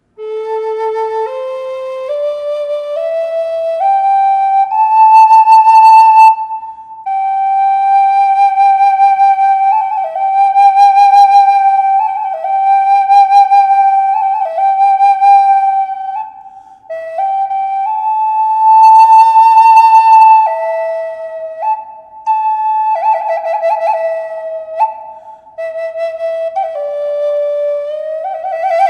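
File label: reverb, flute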